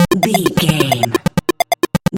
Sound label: retro synth beeps groove